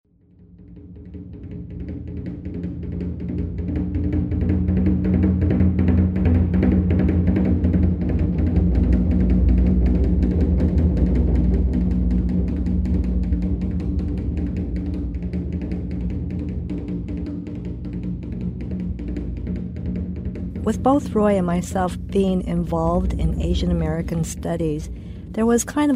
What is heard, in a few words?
with drumming